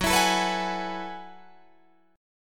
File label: F sharp Minor 11th, Guitar, Drop D